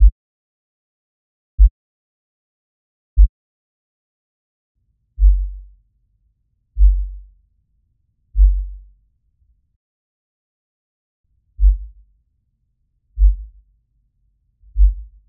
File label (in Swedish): signal utan med